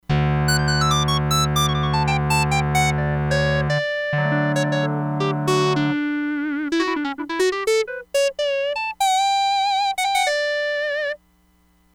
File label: LEAD (SQUARE), Crumar Bit 99; Factory Programs